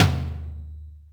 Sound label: Yamaha RM 50 Toms